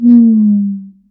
GLISSENDO; Univers Sons - Raricussions

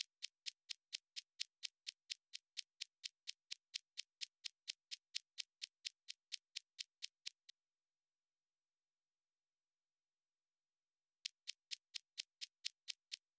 Attack Sound, Drum Loop 128BPM; VEC5 Drumloops; VEC5 Multi Loops; Loop Elements

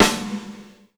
Snare, Live Kit; Sonic Foundry (Sony Creative Software); Ugly Remnants VOL-2; Single Shots; Beatstuff

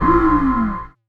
Dance Mega Synth; Best Service; 90 s Sample CDs

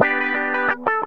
GTR, Funky; Zero-G; Funky Elements

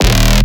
bass hit acid glide, Bass Stabs; Virtual Riot

